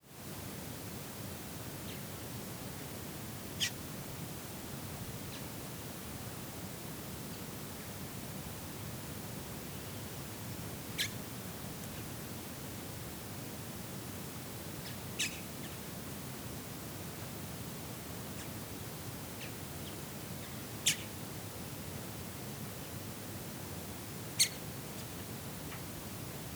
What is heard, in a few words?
Soundscape: La Silla sunrise exterior